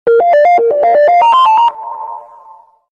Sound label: short notification